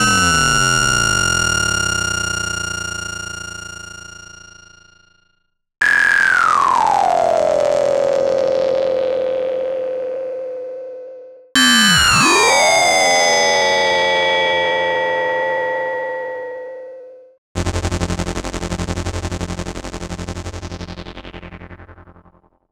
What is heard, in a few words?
Yamaha CS40M FX